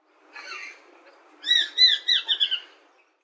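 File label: tumii female, Japanese sparrowhawk; saitama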